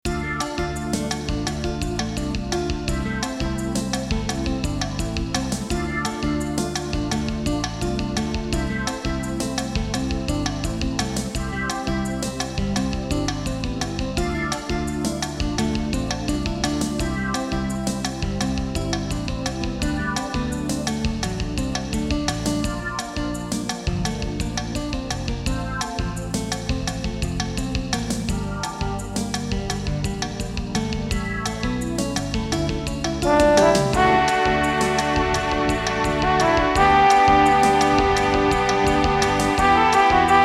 片头音乐

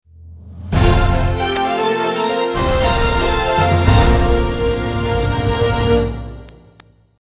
片头音乐

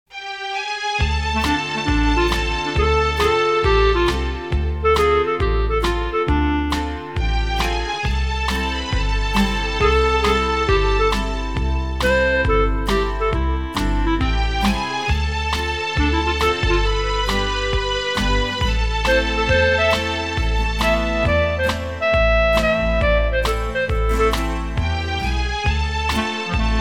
纪录片背景音乐; 1分以内

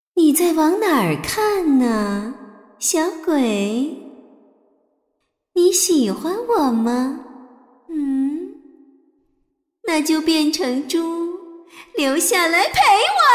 动画 游戏 妩媚女妖调笑及大笑声

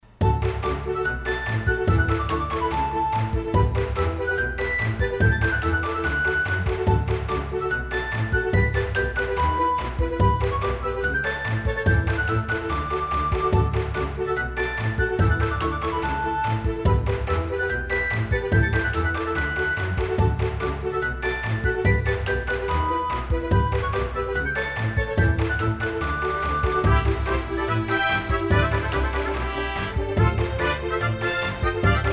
讲故事的背景音乐; 1分以内

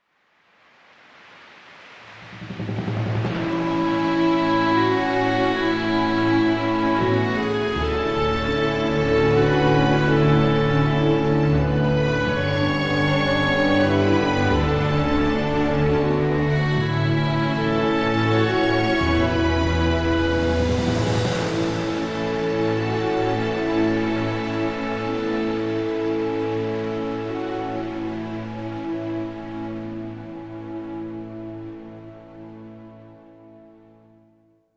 广告片音乐

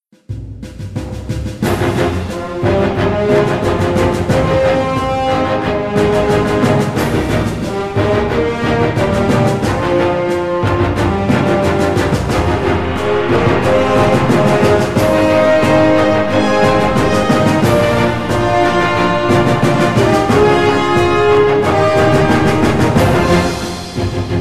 激情的背景音乐

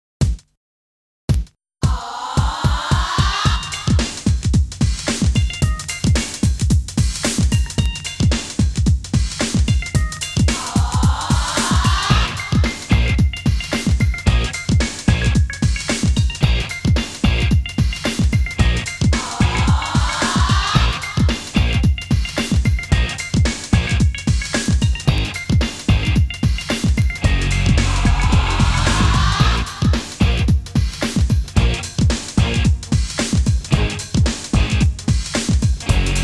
电视包装音乐

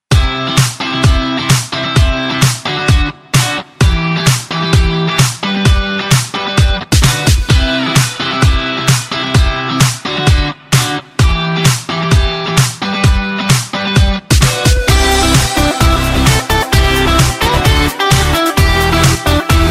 欢快的背景音乐

